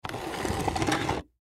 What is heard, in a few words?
Plastic Stool Move, Plastic Stool Movement Slide Scrape; Chairs; Bars; foley; Blastwave